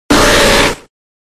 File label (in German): Ruf